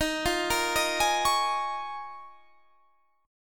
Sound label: Eb 7th Suspended 2nd Suspended 4th Eb; arpeggiated; 23,20,20,20,21,21